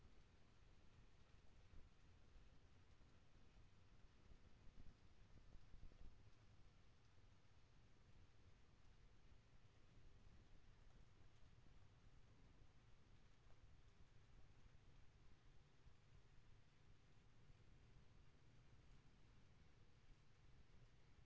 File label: HYDROPHONE 16000Hz; JASCO AMAR HYDROPHONE 740